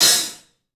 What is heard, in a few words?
HAT, CLUBHAT, 3D Drums; 3D Sound